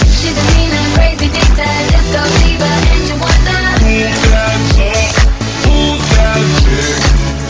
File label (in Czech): hudba; cstrike